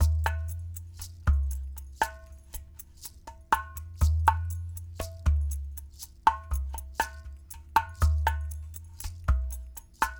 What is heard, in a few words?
Clay Pot, World Percussion; Sony CS; Rines, Shaker